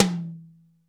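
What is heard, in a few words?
tom, 60s kits; 90 sSampleCDs; Crypton - DrumBase5000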